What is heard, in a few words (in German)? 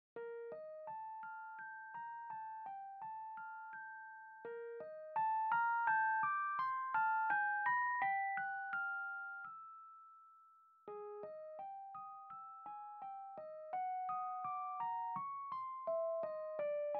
Glockenwerk